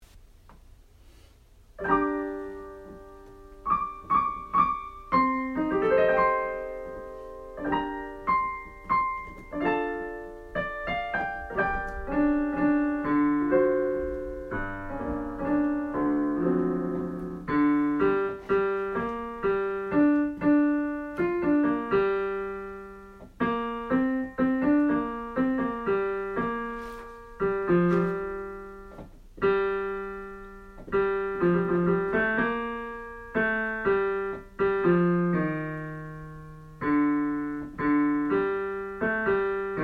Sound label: Bass